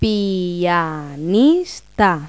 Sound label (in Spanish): PIANISTA; Pipo-Aprende musica con Pipo